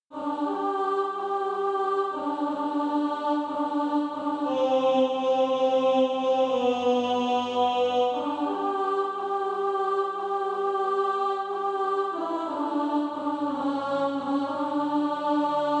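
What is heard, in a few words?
alto, Practice Music; A Little Talk with Jesus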